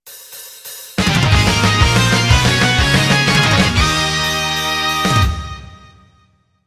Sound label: Win; Zoids Full Metal Crash